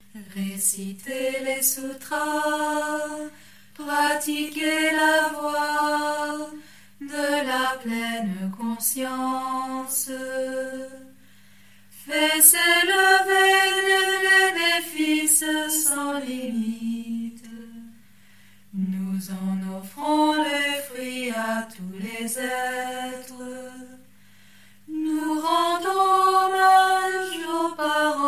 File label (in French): chants gatha de cloture des soutras meditation